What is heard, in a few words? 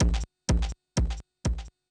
DRUM SHOT HOUSE 125; eJay; Singleshots